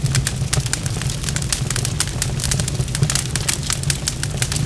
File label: fire; Mafie 1